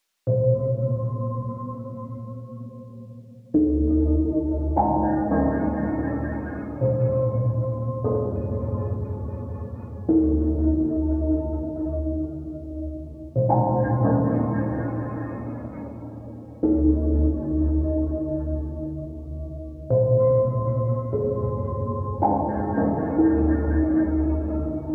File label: Forest Piano